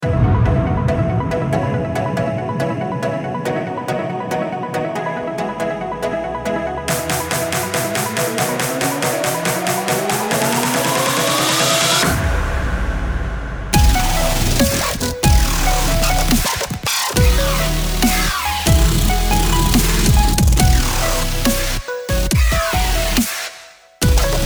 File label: BGM DUBSTEP